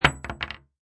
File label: Bullet Shell Drop Rifle Hollow Wood; Bullet shell drop, Rifle: empty Rifle shell falls on hollow wood; Impact; Lynne Music